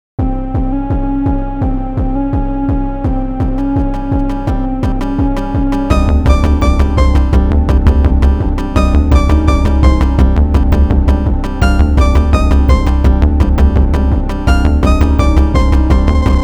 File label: tap tap boo; weeklybeats 2024